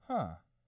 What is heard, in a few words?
"huh"; angband